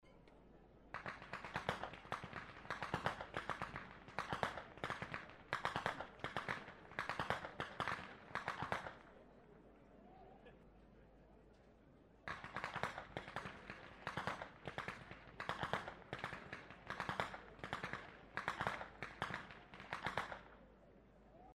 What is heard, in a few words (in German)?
schnalzen, jugend